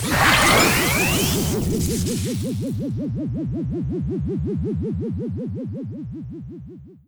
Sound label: Enemy Ufoblock Active Loop; disney star smash android ios mobile gamerip 2020